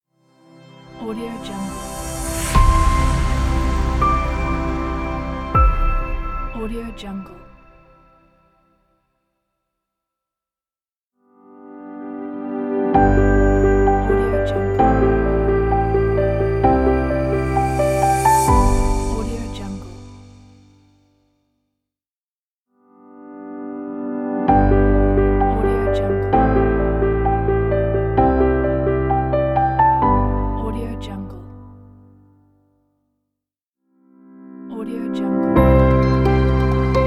intro logo pack

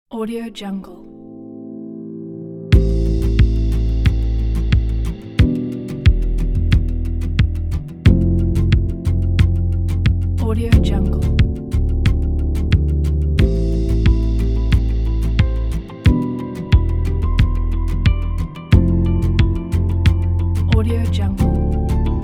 gentle soft chillout background

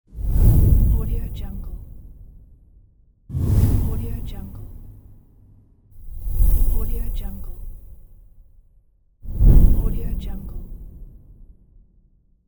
the whooshes

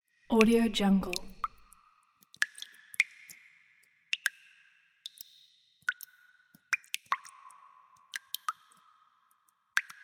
water drop; legatopromusic

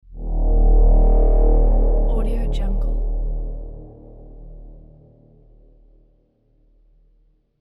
dark cinematic horn

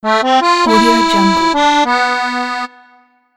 accordion motive

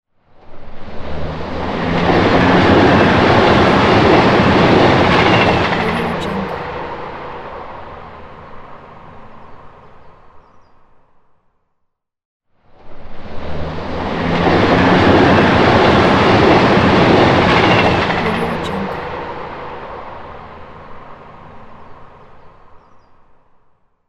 fast commuter train

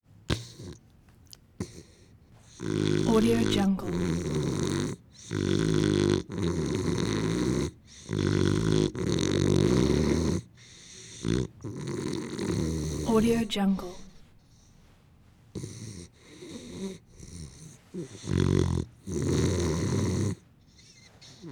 pit bull dog snoring snort breathing

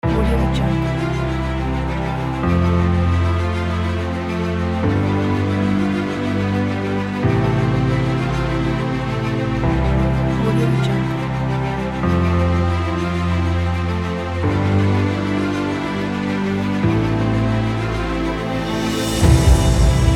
emotional trailer

